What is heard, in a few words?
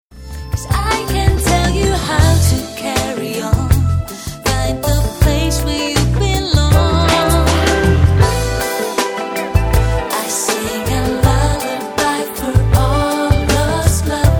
lullaby